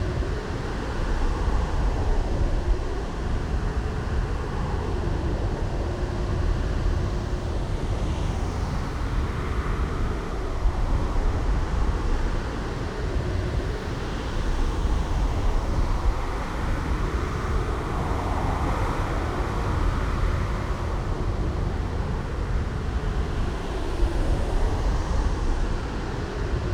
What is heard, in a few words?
atmos blasted steps; Hollow Knight: Silksong